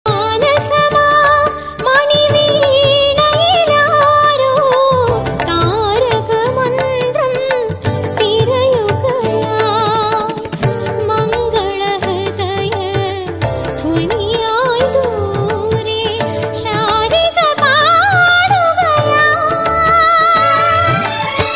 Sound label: old malayalam melody songs